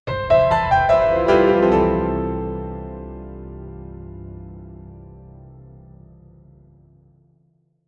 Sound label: elegant achievement sound